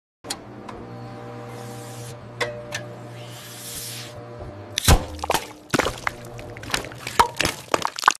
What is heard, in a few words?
Crushing a soda can; مچاله کردن قوطی نوشابه